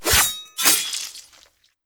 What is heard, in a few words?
Logo